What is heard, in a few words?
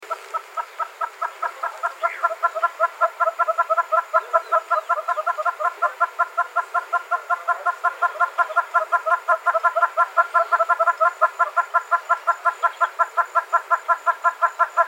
redbilled hornbill mf duet